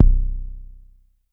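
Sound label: Long bass drum, Jomox Airbase99, Drum Machines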